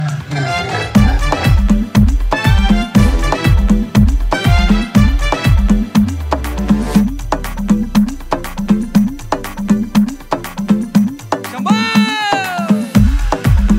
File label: Musical Ringtone